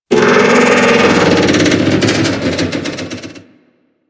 Thumper Bot Spawn Groan A; Warframe; Grineer

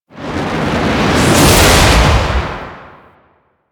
Void Comet Whiz By; Warframe; Void Storm; Railjack

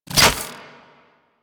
Bow Unequip, Weapons; Warframe; Corpus

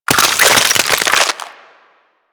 Ice Freeze Hit Effect; Warframe

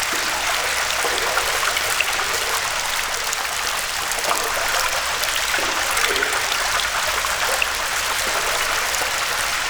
Water Drip Loop, Ambience; Midrath Island; Fey Hub

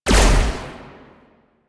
Amp Silenced Shot, Weapons; Warframe; Operators; Amplifiers; Drifter

